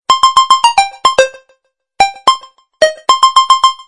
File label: Android Bgm Ringtone; ringtones